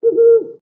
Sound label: flashlight; Xiaomi Mi-CC9